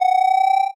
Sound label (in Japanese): purupuru